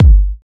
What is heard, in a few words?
good bass drum g key; kick drum samples